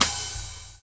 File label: Hcl, Roland MV8800 layered